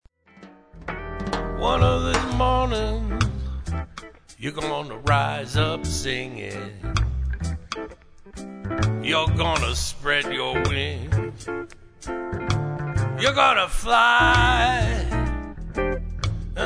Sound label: Summertime Reggae